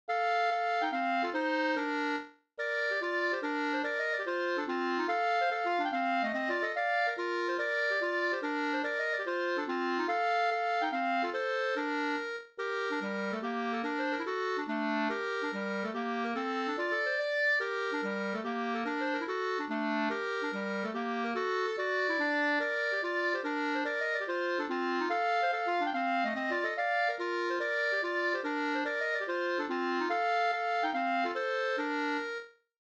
folkdans; Trekarlspolska

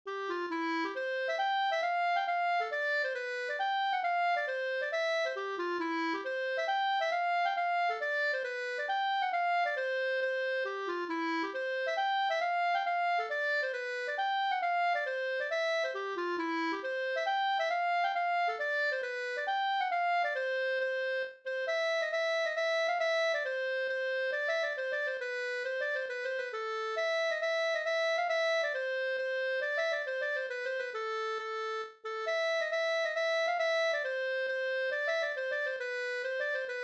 hambopolska

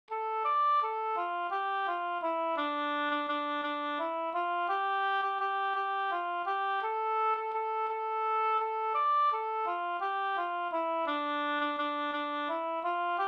vals valssi

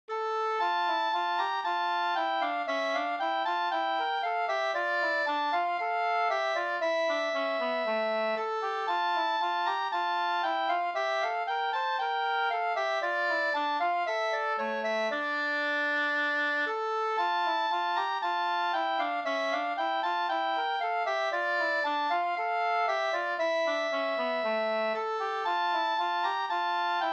ganglat Brudmarsch; Skraddar-Edvards ganglat fr Ludgo SvL

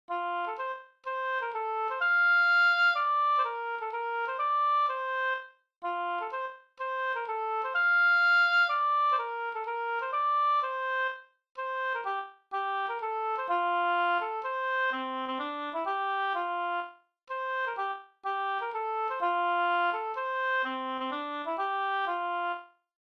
polska

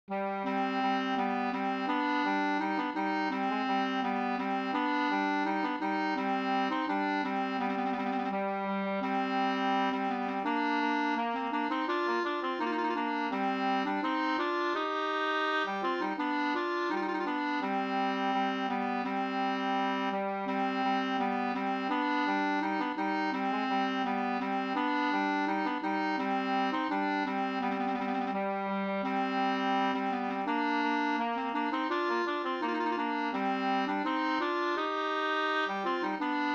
ganglat C moll; Peltoniemen Hintriikan surumarssi fi

